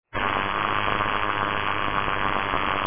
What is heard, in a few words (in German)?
citizen, armbandwecker